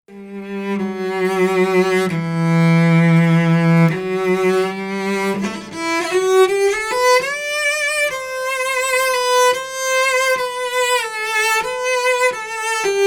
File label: CELLO